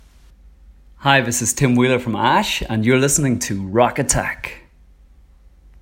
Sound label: Jingle; Rock Attack - Your Rock Radio